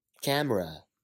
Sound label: camera